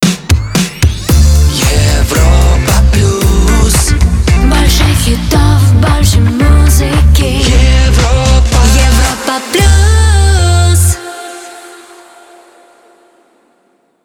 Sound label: Jingle; Europa Plus 2022